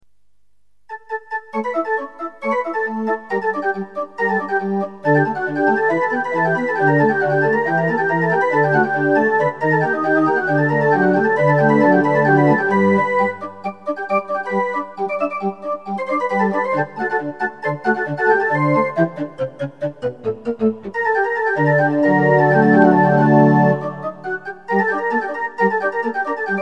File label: organ